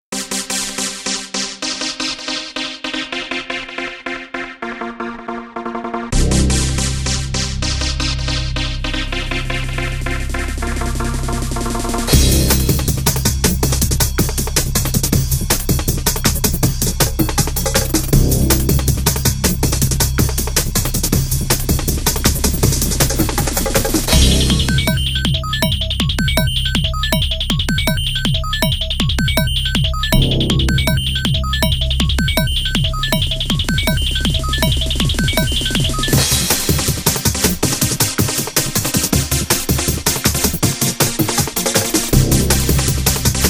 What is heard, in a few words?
fight music